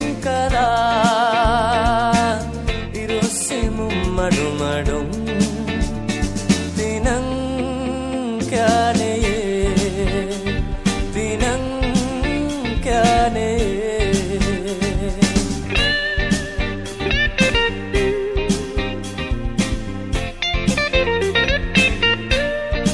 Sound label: song ringtone